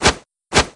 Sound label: shimmy